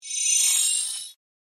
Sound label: shooting star sound effect